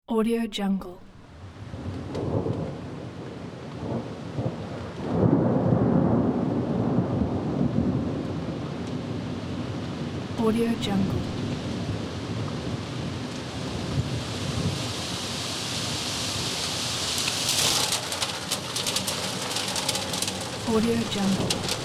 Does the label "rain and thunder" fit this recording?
Yes